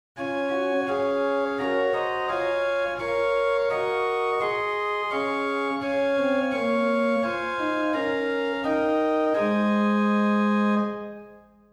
Octava 4, organ stops; Silbermann middle-sized church organ; St. Anne; Reinhardtsgrimma

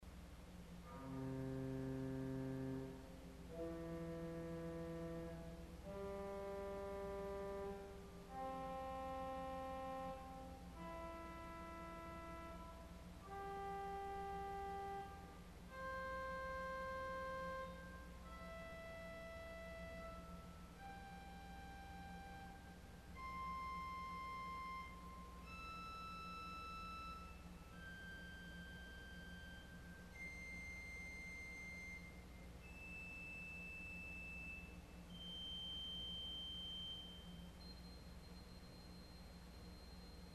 Voix Celeste Arpeg, organ stops; CulverAcademy

